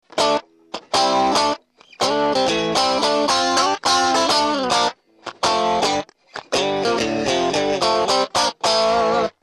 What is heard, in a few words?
cable